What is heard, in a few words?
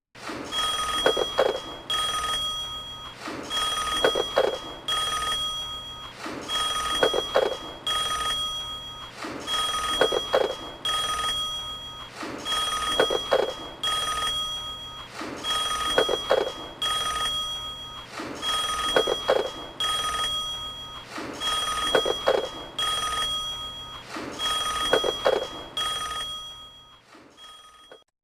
Phone; Kid Pix 3 Deluxe; Windows